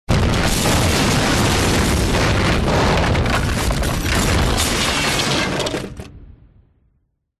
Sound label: huge explosion; zapsplat